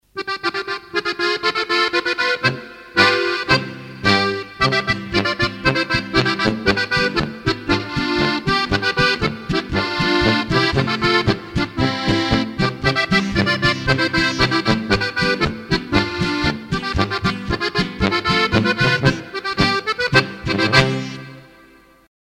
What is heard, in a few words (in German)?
Plattler Langschottisch